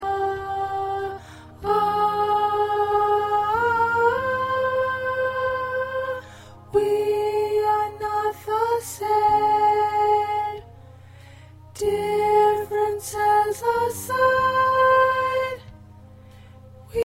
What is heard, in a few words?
Soprano